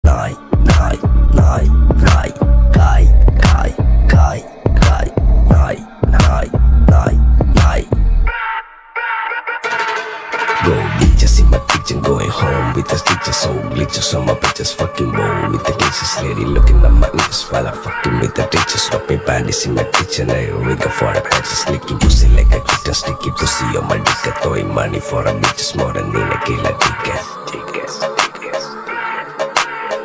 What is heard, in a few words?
slowed reverb ringtone